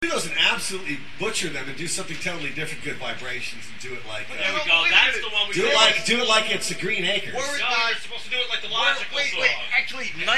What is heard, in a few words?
good reggae